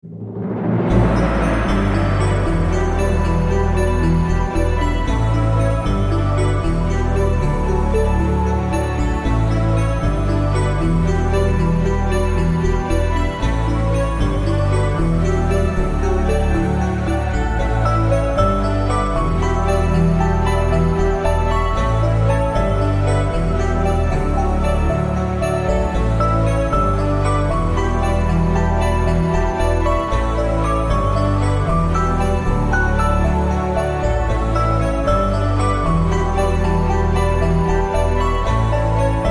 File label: Bonus; Enigmo 2